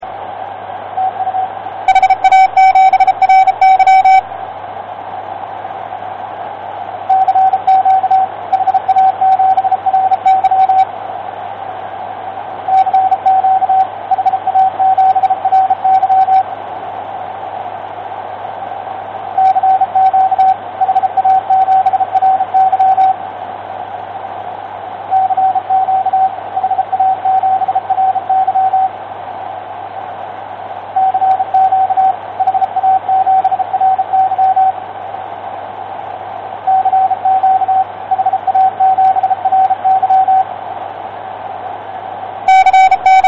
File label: rotary beam operation